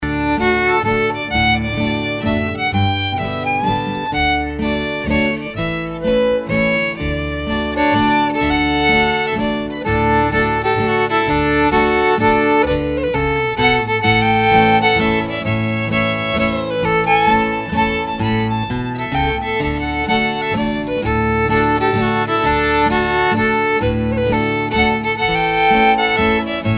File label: waltzes